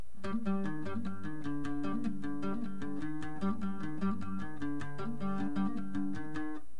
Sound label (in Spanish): Fantasía final, bajo sexto y acordeon